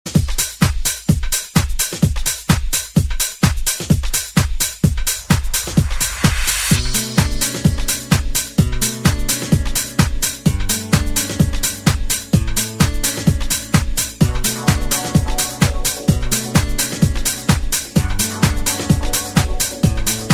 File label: Groovy Disco